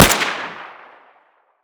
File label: m4 suppressed fire, weapons